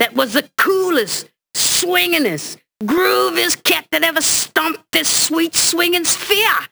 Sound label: Vocal Phrases; Vengeance Samples; Vocals